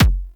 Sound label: Weapon Punch Rifle; Warframe; Weapons; Rifles